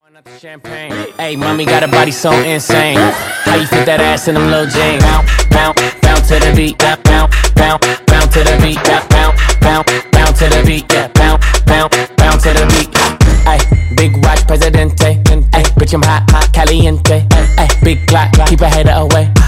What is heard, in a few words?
Wordplay Transition 117 128 Bpm